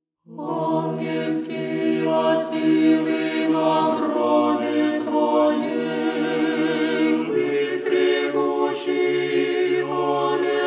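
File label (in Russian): Glas 6 Tropar voskresnyj, resurrection troparion tone 6; Angelskiya sily na Grobe Tvoem; Sokracshennyj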